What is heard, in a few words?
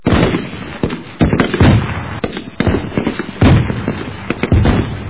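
infantry attack with tank sounds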